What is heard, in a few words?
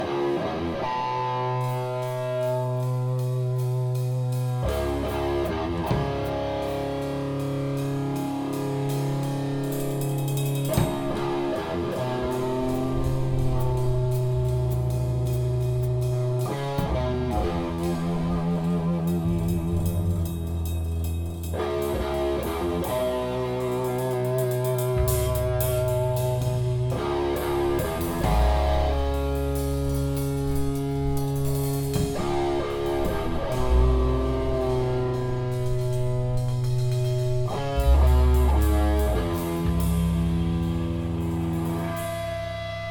riff slicer